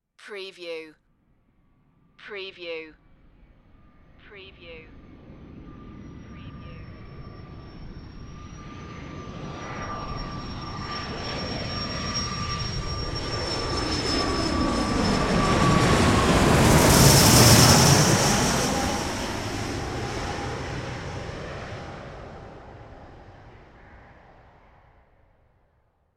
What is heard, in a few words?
Jet Pass By Small; Sound Effects Bible